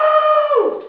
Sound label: ragged zombie hit